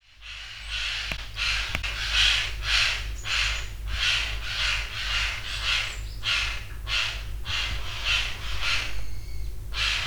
ルリカケス 地鳴き; カラス科; 鹿児島県大島郡龍郷町 自然観察の森; bird song; 地鳴き①

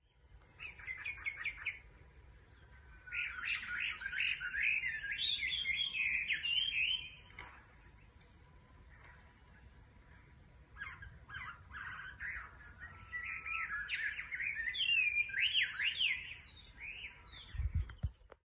ガビチョウ さえずり; チメドリ科; 栃木県芳賀郡市貝町; bird song

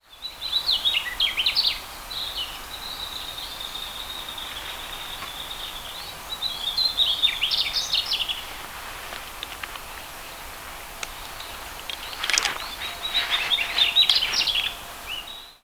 mugimaki, bird song

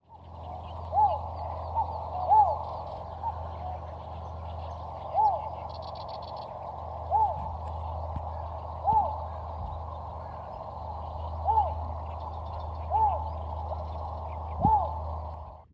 yosigoi, bird song; ヨシゴイ; 鳴き声; ペリカン目; サギ科; 新潟県阿賀野市 瓢湖

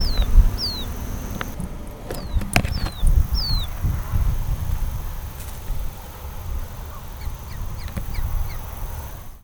キジ, ヒナ, 田んぼ; キジ目; キジ科; 新潟県新潟市秋葉区 田んぼ; bird song

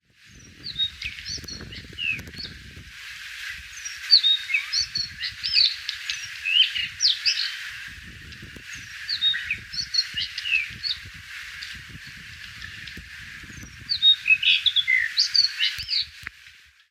kuroutadori, bird song

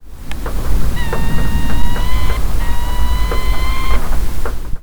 hayabusa, bird song; ハヤブサ; 鳴き声; 新潟県関川村岩船郡

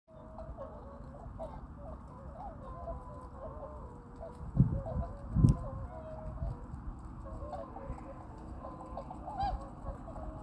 kohakutyou, bird song; コハクチョウ; 鳴き声; 新潟県新潟市秋葉区 田んぼ; J-eco 鳥のさえずり図鑑

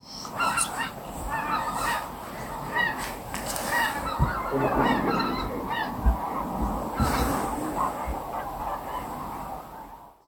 マガン 鳴き声; カモ目; カモ科; 新潟県新潟市北区 福島潟; bird song

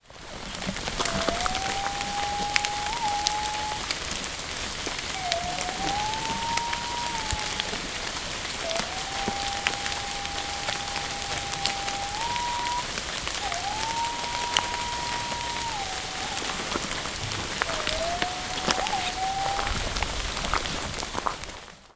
zuakaaobato, bird song; ズアカアオバト; 鳴き声; 鹿児島県奄美大島 自然観察の森; J-eco 鳥のさえずり図鑑

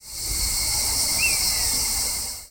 ハチクマ 鳴き声; タカ目; タカ科; bird song

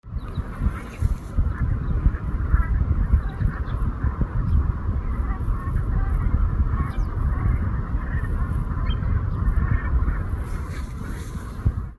コクガン 鳴き声; 宮城県仙台市宮城野区 蒲生干潟; bird song

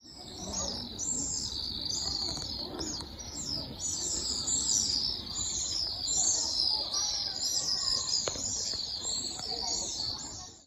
アマツバメ 鳴き声; アマツバメ目; アマツバメ科; 栃木県日光市中宮祠; bird song

